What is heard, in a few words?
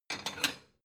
plate small take slide hard